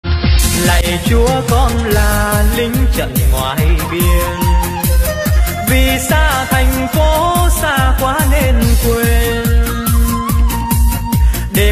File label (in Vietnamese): LK Nhạc Sống Hà Tây Nhạc Bolero Remix Sôi Động